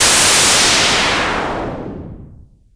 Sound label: MiniBrute, Filtered Noise; noise hiss crackle